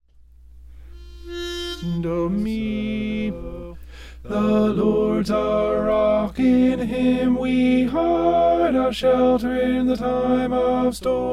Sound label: tenor